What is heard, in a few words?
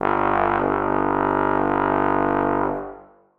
bass trombone g1; Sonatina Symphonic Orchestra